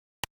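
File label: switch on, A realistic flip switch mimicking a physical light switch.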